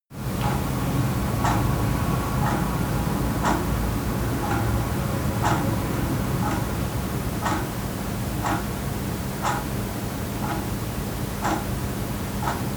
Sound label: bedroom big